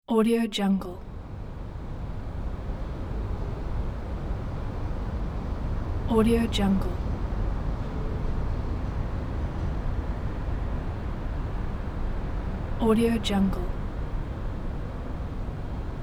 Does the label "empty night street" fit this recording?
Yes